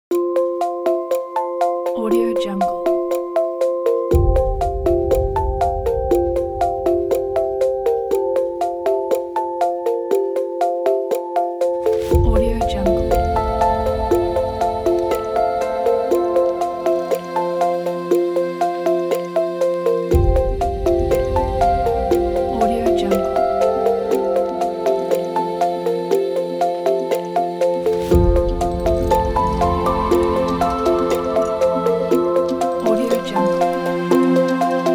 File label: countdown